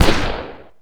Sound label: navy fire; weapons; tf2; fistful of frags